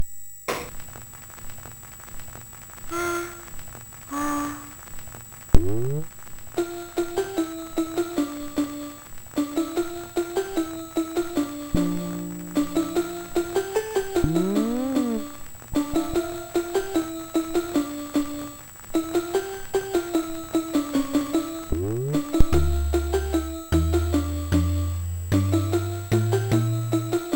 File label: Music Box, MOS6581R3